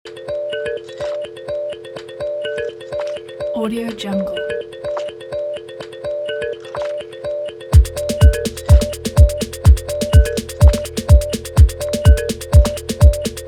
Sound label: organic downtempo